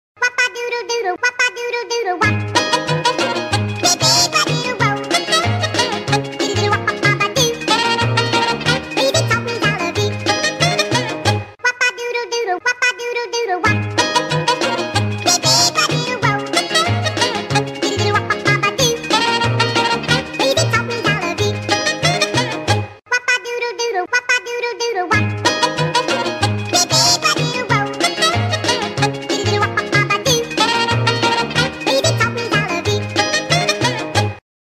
Funny Baby Ringtone; ringtones

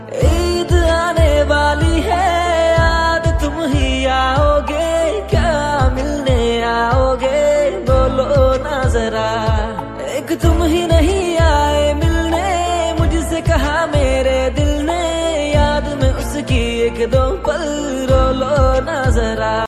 Sad Love Song

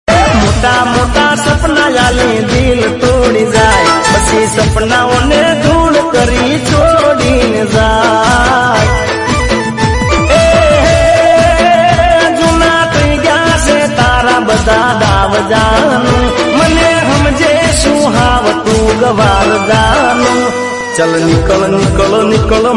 Gujarati Song Ringtone

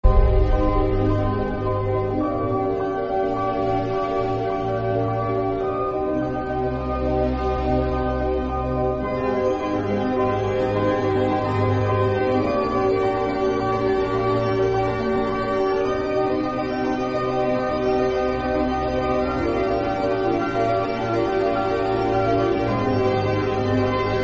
Speed Up; ringtones

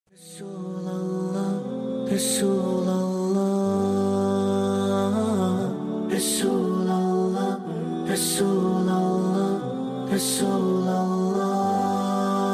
Naat Ringtone

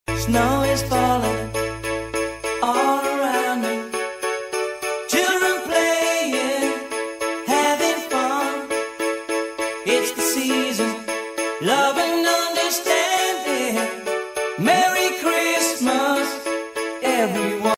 Merry Christmas Ringtone

